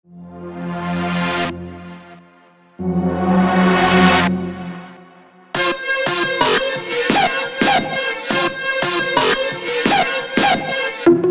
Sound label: synths